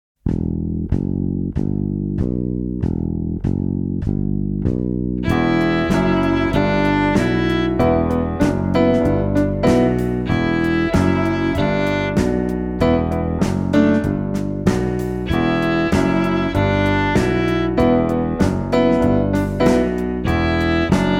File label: Rhythm Fever